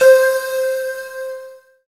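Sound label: synthesizer, digital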